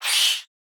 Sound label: Damage